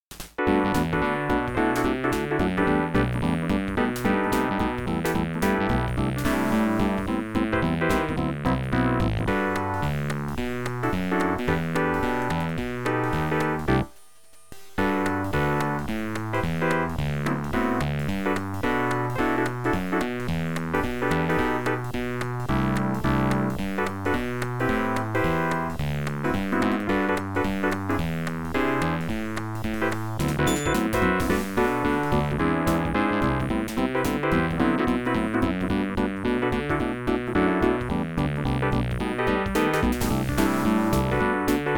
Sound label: JAZZ, fm midi music, true emulation